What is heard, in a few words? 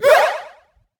flower pain